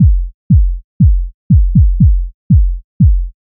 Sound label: basic bassdrum 120 bpm